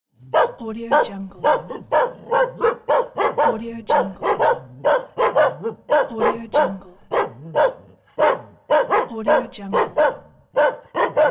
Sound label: small dogs barking simultaneously; sound dispenser